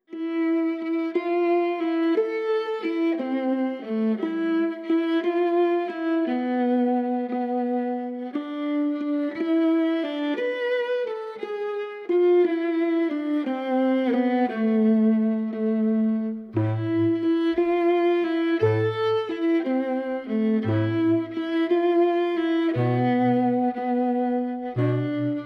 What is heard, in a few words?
Instrumental